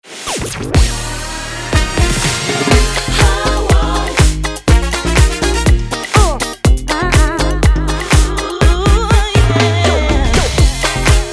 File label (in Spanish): Son Latino